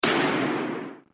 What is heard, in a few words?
Shoot